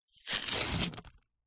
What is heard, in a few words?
household plaster band aid open peel